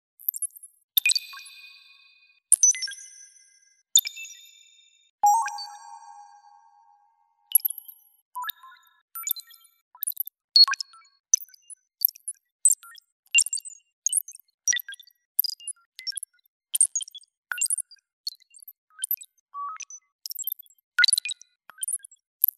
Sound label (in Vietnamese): Âm thanh giải mã bẻ khóa hack thông tin dữ liệu